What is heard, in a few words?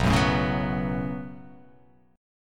B 7th Suspended 2nd; B7sus2; strummed; JGuitar